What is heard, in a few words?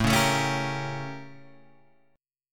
A 7th Flat 9th A, Guitar Open G